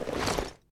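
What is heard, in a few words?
Weapon grenade machine gun reload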